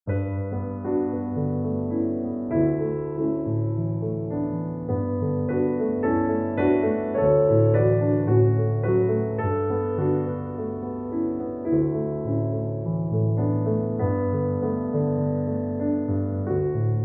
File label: Solo Piano